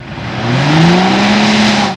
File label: Cadillac Hi Rev